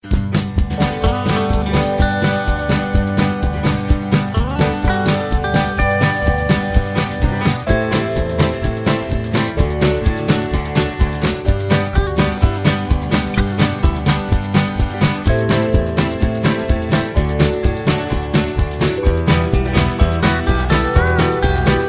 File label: music